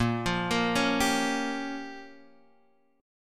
A sharp Minor 6th, down, Guitar, Standard; arpeggiated

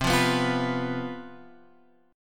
C Major 7th Suspended 4th, down, Guitar, Open F; CM7sus4 strummed

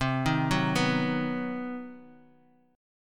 C Minor Major 7th Flat 5th chord, Bass Standard; arpeggiated; JGuitar

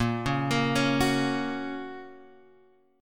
A sharp Minor Sharp 5th, Guitar, Open G

